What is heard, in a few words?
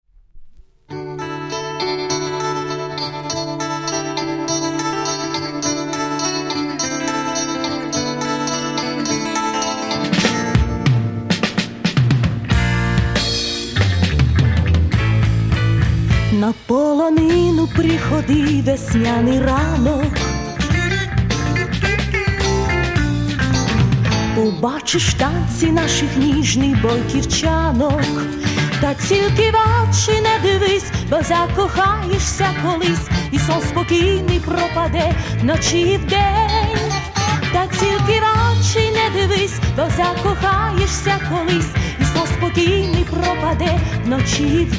female funk pop